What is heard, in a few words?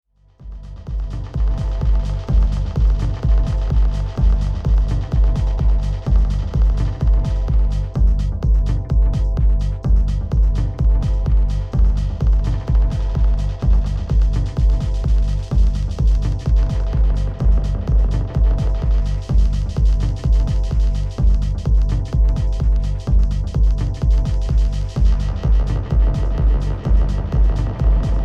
Pioneer DJS 1000 Dub Echo